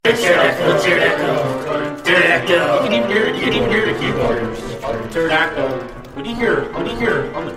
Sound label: pterodactyl midihell; broteam